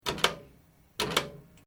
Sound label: Switch Light Pull Cord Double Activate; Various switches; Lights (Sound Effects:Household)